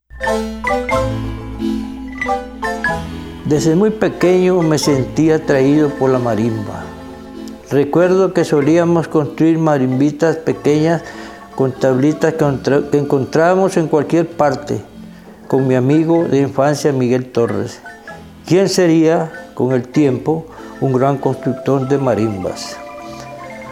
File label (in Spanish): marimba ninos